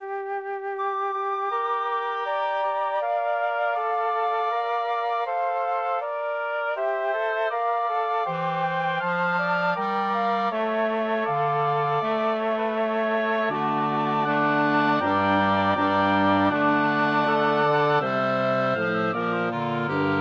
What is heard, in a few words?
for woodwind ensemble; lassus orlande de; giovane donna